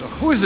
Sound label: close door